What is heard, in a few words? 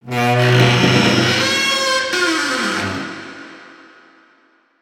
Cave Wood Creek; ambience ninjago